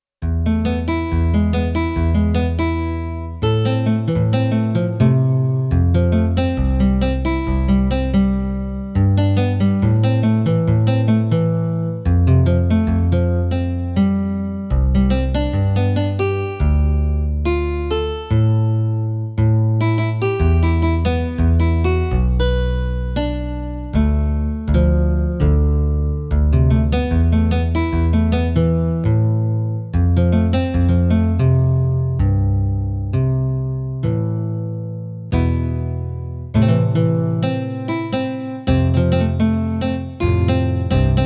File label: Tickling The Guitar